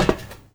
Step Metal Iron

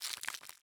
Eat

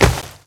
Step Grass Layer; PEAK (2025)

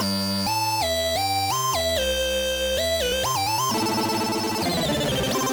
Loading; MiSide

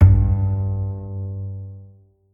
Bass Note; Bendy: Lone Wolf

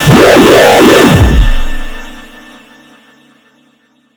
Jumpscare ( L O U D); gamerip; The Salesman

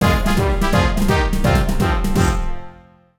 Jingle Game Over; Castle Smasher; Android, iOS

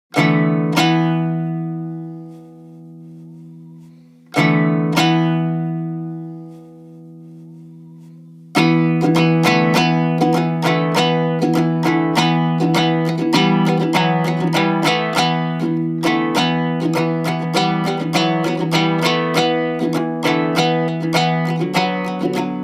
Tempo 100.0 bard kitara; assassin s creed valhalla

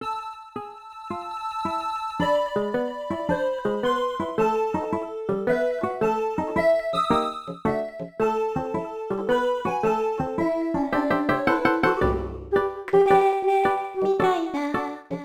Palm Tree Paradise; Back From Caves

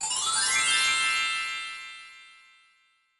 Magic; mahouteq

